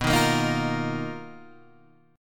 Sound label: B Major Sharp 11th, Guitar, Half Step Down; BM#11